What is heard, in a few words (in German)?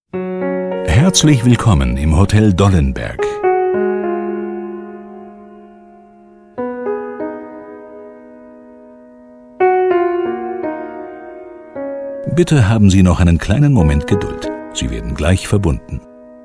Warteschleife; Hotel Dollenberg